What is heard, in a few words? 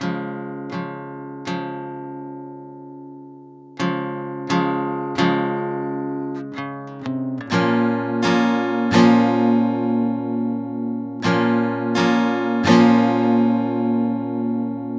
Guitar 128 BPM A# min, Loops; Ghosthack; Ghosthack Advent Calendar 2021; Camp Fire